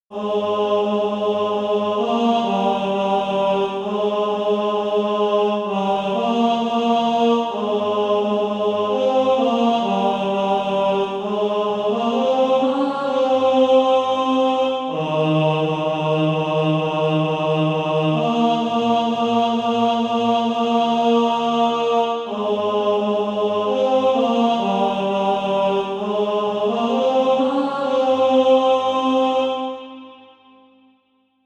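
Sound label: tenor, SATB Practise Music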